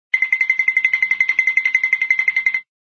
tip toe; cartoon; hannah barbera cartoon sound fx

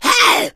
smoker attack

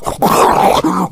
rage, infected, male

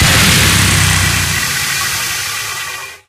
giant heavy gun wind down; source engine; tf; mvm; video games

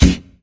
tube suction object; Source Engine; Portal 2

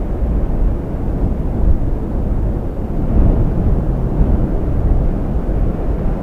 desert indoors, ambient; Source Engine; tf

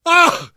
hurt critical, survivor voice

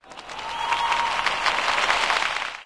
baseball asterisk; sound schemes

